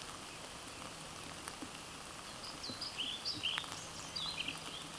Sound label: Ficedula hypoleuca